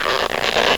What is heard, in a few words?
BALLOON; Sexy Games 3